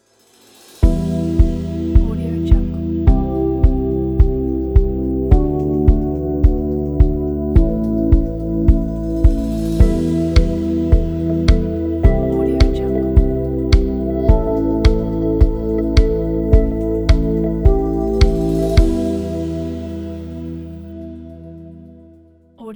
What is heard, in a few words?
ambient chill logo; supersoundfactory